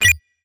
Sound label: UI Menu Navigate